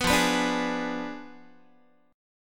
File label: F Diminished 7th F down Guitar; Fdim7 strummed; JGuitar